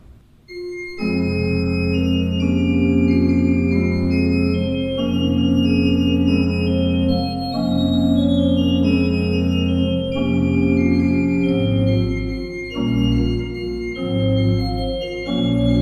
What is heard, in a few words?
Fuehrer, pipe organ